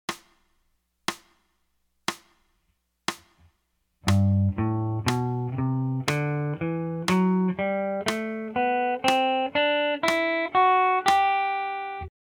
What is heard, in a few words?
g major up; scales